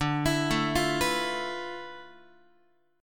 D Minor 6th Add 9th down Guitar; Dm6add9 arpeggiated; JGuitar

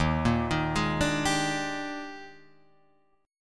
Eb Major 11th, chord; EbM11; arpeggiated; JGuitar

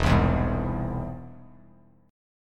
E Augmented; E+ strummed; JGuitar

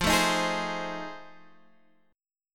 F 7th Sharp 9th, chord; F7#9 Chord {X 8 7 8 9 8} strummed

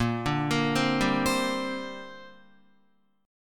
Bb 6th Add 9th Bb Guitar; Bb6add9 arpeggiated; 6,5,8,5,7,7

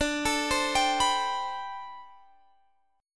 D 13th Suspended 4th D; D13sus4 arpeggiated

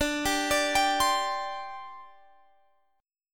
D 7th Suspended 4th

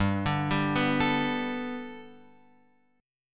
G Major Add 9th Guitar Open F, chord; Gadd9 arpeggiated

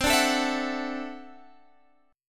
D-Major Add 9th C down Guitar Standard; strummed; JGuitar

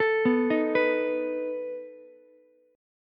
A Suspended 2nd Flat 5th A down Ukulele; Asus2b5 arpeggiated

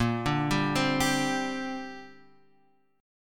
A sharp 6th Add 9th, Guitar Drop D

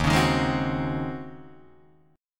Eb Minor 13th Eb down Guitar DADGAD; Ebm13 strummed; JGuitar

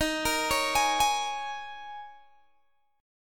D sharp 7th Suspended 4th D sharp, Guitar Open G

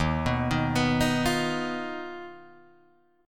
Eb Suspended 2nd Sharp 5th, Guitar, Drop D

